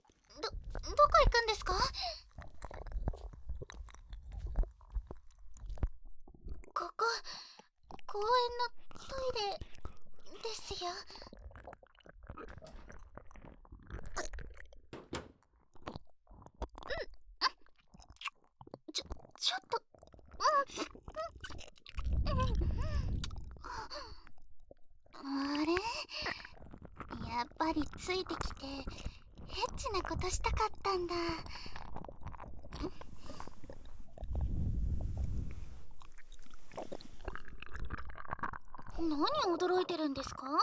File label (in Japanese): 耳舐めされながら、どすけべJKと公園のトイレでキス・お掃除フェラ・パイズリフェラ・対面座位し、保健室でバック・正常位でキスハメする音声; 特典②24分間耳舐めされながら、どすけべJKと公園のトイレでキス・お掃除フェラ・パイズリフェラ・対面座位し、保健室でバック・正常位でキスハメする音声